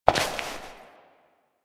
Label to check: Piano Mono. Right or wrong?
Wrong